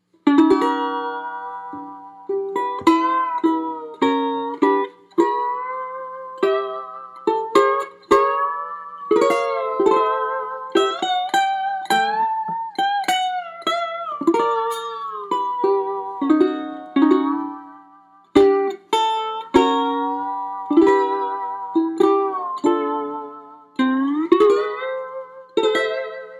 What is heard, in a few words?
white uke lap xmas